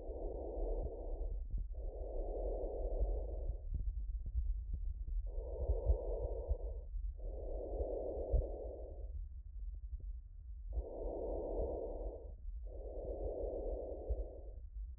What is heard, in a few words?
normal vesicular, respiratory; pediatric; pediatric-ai-scribe